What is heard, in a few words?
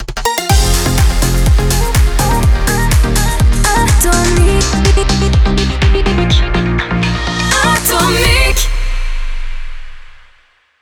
JINGLE RMX